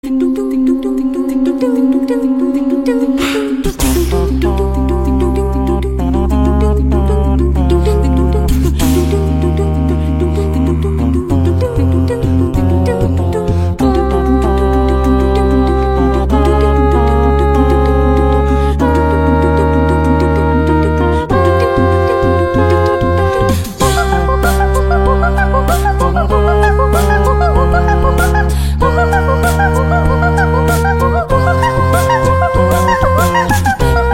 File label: Acapella; ROBLOX